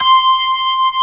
B3 C 3-3, ORGAN; AKAI S-Series CD-ROM Sound Library VOL-1

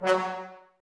Brass; Miroslav Vitous; Woodwind Brass Ensemble; Brass Finale

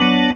CHORD BM, B3; Sounds Good - Roots Reggae; B3 CHD

